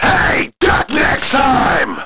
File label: duck